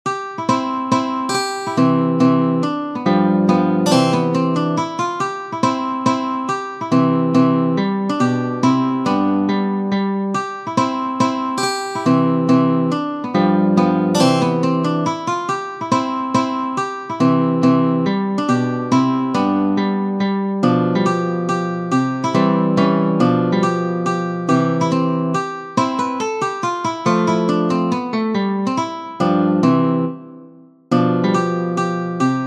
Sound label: valse moderato, guitar